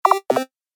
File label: Retro Gaming Sound; Video Game Sound Effects